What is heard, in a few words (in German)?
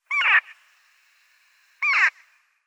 Elsterdohle Corvus dauuricus; Vogelstimmen